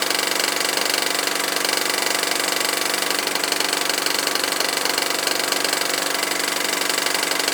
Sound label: Film Projector Loop; Warframe; Ambience; NineteenNinetyNine